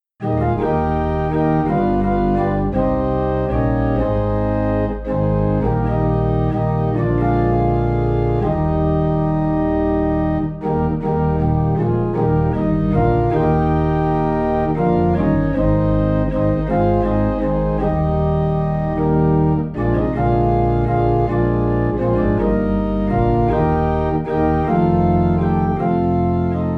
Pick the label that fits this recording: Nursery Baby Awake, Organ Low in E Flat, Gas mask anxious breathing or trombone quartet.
Organ Low in E Flat